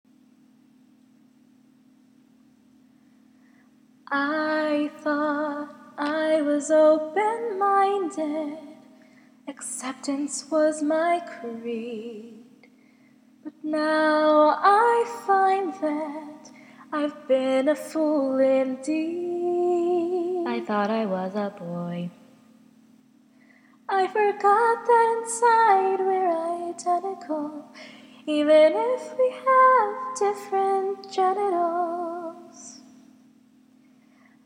Song Cover bahahahaha singing; American Dad; I'm Having a Crisis

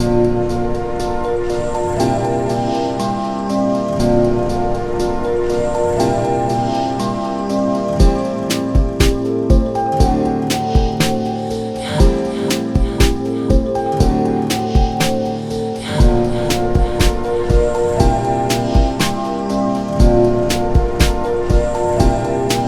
beat

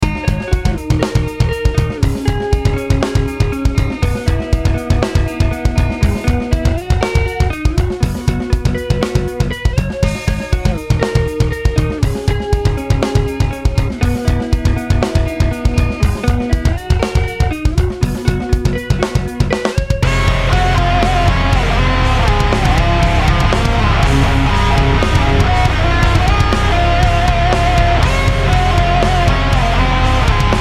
riff

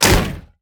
Monster; Zenless Zone Zero

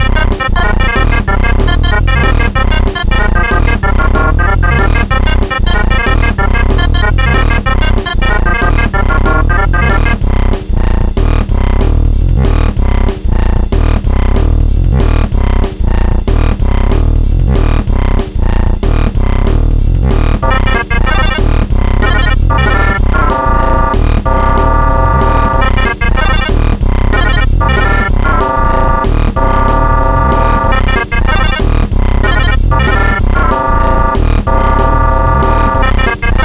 drum n bass thing

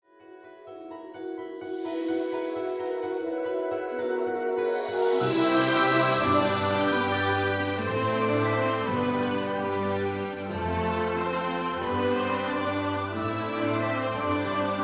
BGM; MLP Friendship is Magic (Mobile Game); My Little Pony OST Repository

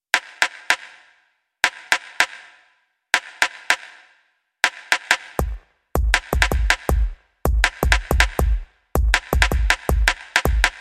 groove

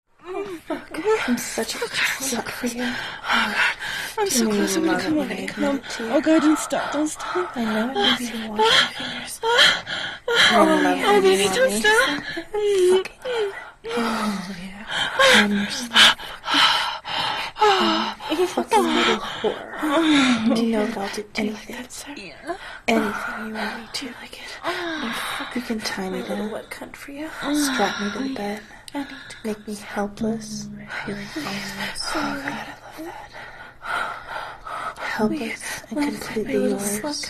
Orgasmic ambience experiment

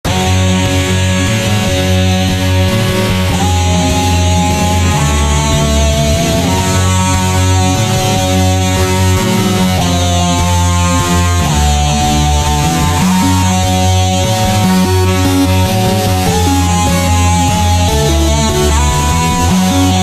Metalstep Remix

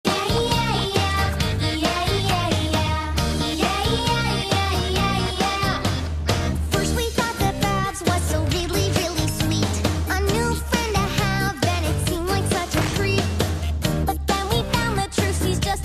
Dubstep Remix; Incautus

